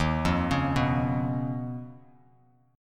Eb 7th Suspended 2nd Sharp 5th Eb, Bass Standard; Eb7sus2#5 arpeggiated; JGuitar

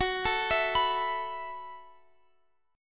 F sharp Minor 11th, Guitar, Open F; F#m11 arpeggiated; x,x,18,16,19,18

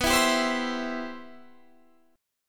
C Major B

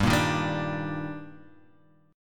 Eb Major 7th G

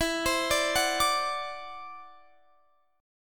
D 7th E, guitar; D7/E; arpeggiated

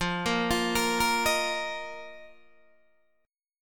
F 7th Suspended 4th F, Guitar, DADGAD

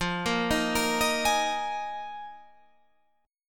D sharp Suspended 2nd Suspended 4th

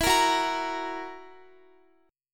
E Suspended 2nd Flat 5th E down Guitar; Esus2b5; strummed

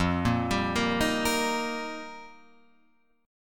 F 7th Suspended 2nd Suspended 4th F Guitar Open F; arpeggiated; chord; F7sus2sus4; JGuitar

